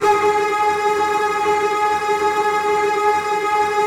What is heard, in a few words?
Virtuoso Strings, TRM FX; ILIO; Kirk Hunter Virtuoso Strings VOL-2; 10CL TRM FX